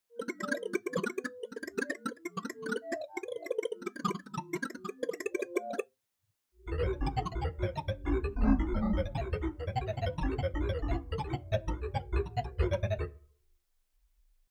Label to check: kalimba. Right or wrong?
Right